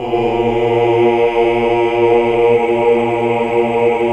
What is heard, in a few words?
AAH, Hallelujah